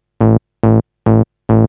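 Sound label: SYNTH BASS DANCE 140 A; eJay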